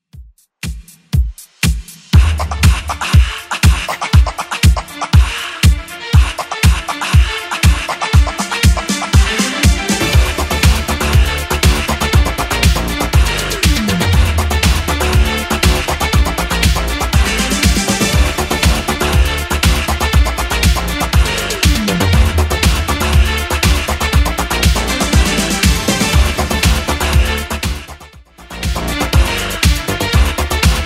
80s Redrum 120bpm

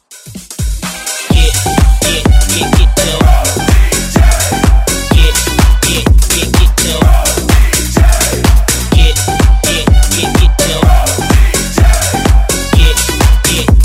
What The DJ Said House Redrum

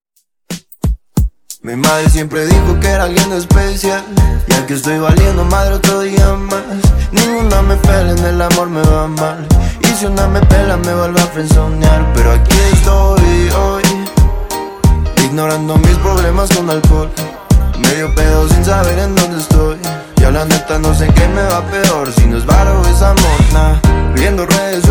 Funk Intro Outro 90Bpm

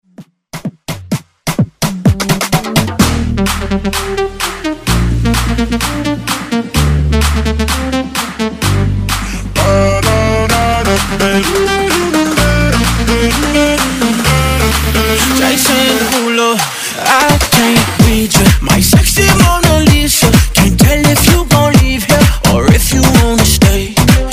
Afrobeat Redrum

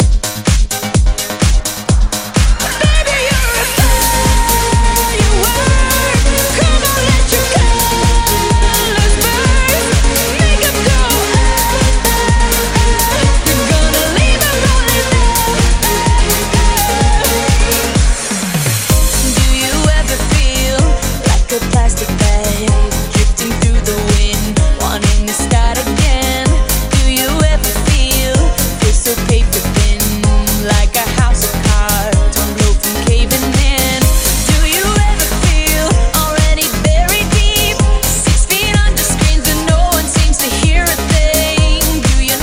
127bpm Bbm Hype; remixplanet

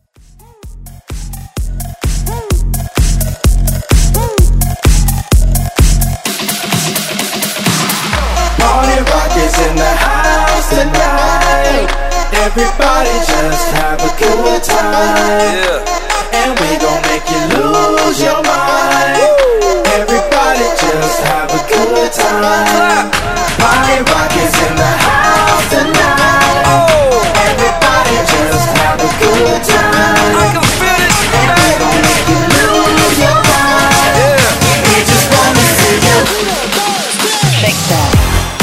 Festival Mash

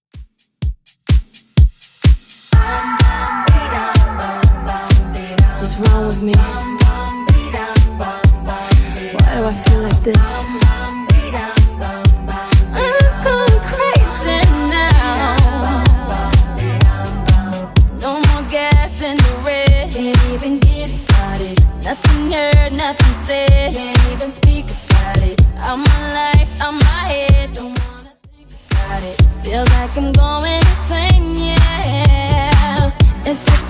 Top40 Redrum 126bpm

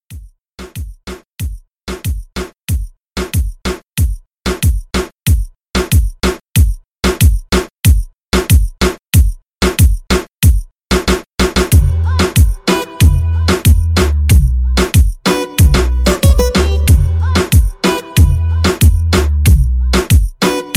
Latin Reggaeton In Out 93

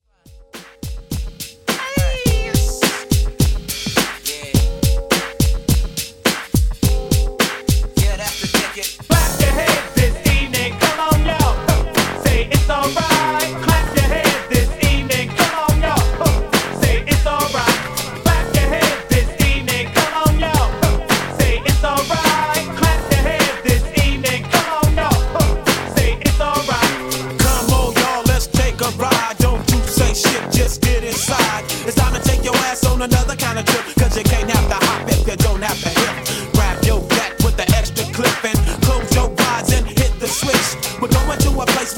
Classic Redrum 105 Bpm; djyan

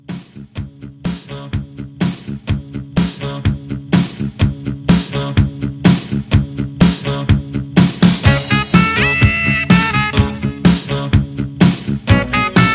80s Intro Outro 125

